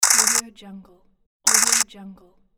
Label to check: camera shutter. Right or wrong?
Right